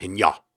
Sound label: Grunts and Chants, Viking Vocal